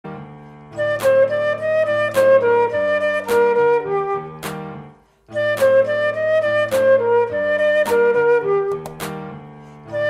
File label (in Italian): Flauto Traverso